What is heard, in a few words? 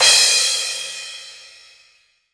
CR, Casio CTK-5000